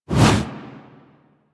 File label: Generic Weapon Aim Transition In; Warframe; Weapons; Archwing